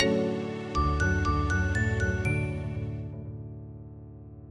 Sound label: jingle; Sonic1 J2ME; CN CMCC